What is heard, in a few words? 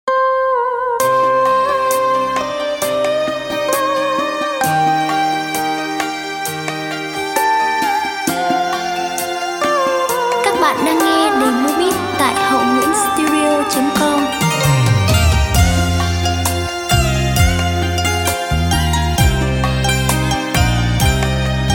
Long me beat, playback